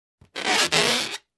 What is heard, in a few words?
Balloon squeaking sound